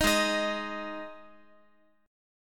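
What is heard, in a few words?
A flat 5th A flat Guitar, chord; strummed; x,x,x,8,8,10; JGuitar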